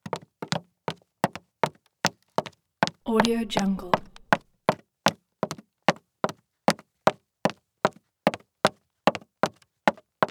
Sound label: footsteps wood; prosoundfx